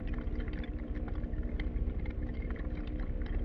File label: Battery Indicator-Machine On Loop